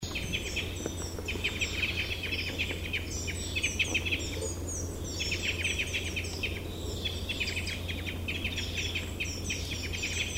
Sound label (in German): Fichtenkreuzschnabel; Ruselgebiet; Susanne Rieck